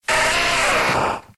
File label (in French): Cri; Cri de Racaillou dans {{Jeu XY}}.